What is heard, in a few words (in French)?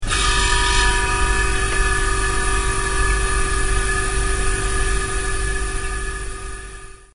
Frigo; bruitage; Electro-menager